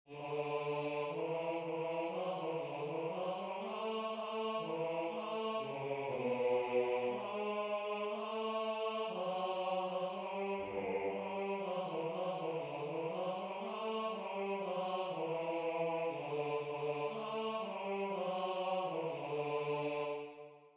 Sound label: Psalite deo Basse; Sicut locutus est; Bach; Baroque